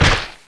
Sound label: ballista hit person; Caesar III